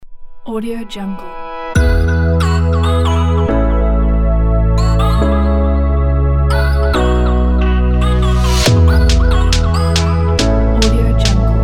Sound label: inspiring dubstep